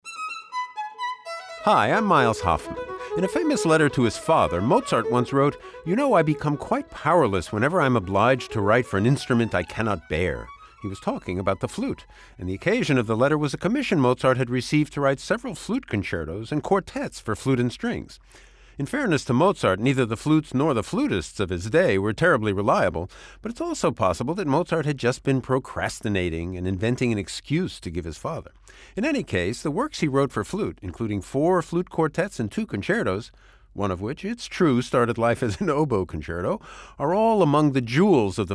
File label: flute quartets; mozart